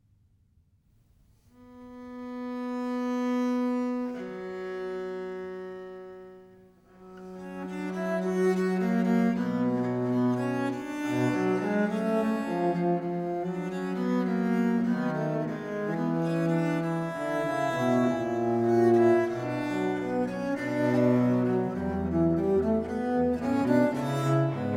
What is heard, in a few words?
duo for two bass viols, in c major minor